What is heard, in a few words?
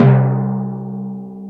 TIMPANI